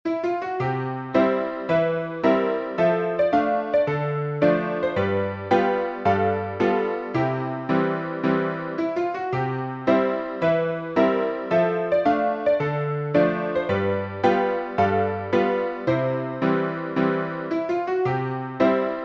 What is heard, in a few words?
piano, sheet music